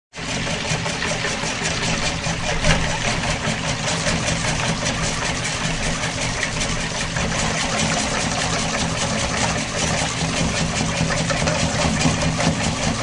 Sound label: Mixing whipped cream.; Food Prep; Restaurants